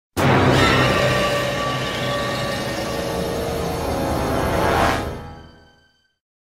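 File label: Stinger Heavy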